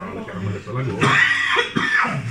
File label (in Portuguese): tosse